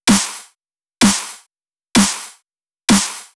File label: Drum Loop 128BPM SN; VEC5 Drumloops; VEC5 Multi Loops; Loop Elements